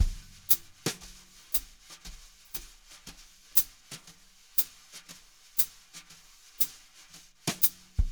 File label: jazz brush loops